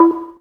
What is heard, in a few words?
Synths E; Vengeance Samples